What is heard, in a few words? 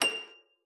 STOP E7, HARP; Xsample VOL-8; HARP+FX